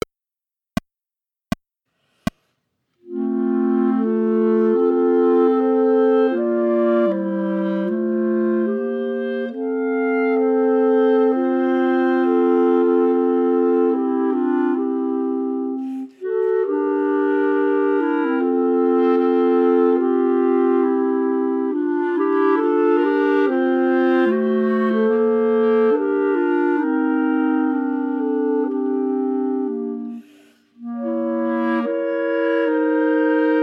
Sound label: Prayer Ensemble minus 4th part; Humperdinck